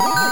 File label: fruit appeared; Mrs PacMan